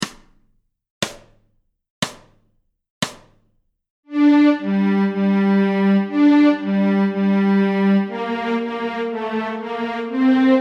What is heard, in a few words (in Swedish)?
Stråk Gmoll, Fiol; Björnlåten; Notträning